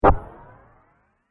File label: Multimedia Swipe, transition; Andrew Fleming